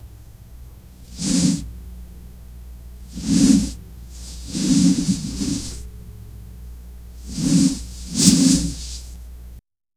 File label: quiet rope glide; equipment